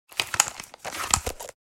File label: Brain teaser solve puzzle solution